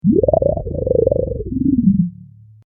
Gigantic Sleeping Space Toad Snores, monster sound effect; science fiction sound effect; star wars / trek style games; Andrew Fleming